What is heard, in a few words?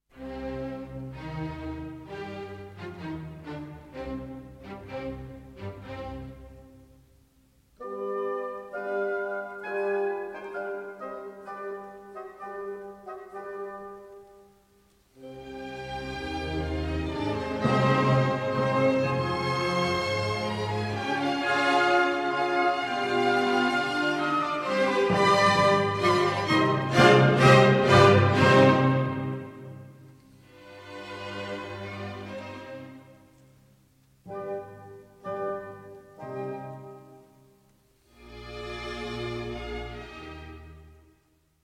Piano Concerto in C Minor, Allegro Con Brio; Beethoven; Piano Concerto No. 3; Op. 37 I.